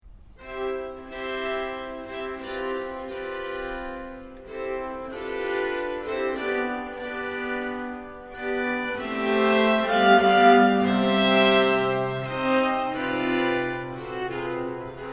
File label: Prelude In C Major